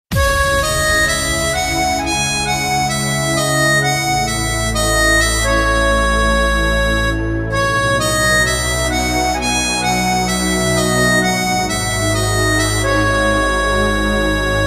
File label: Sweet Instrumental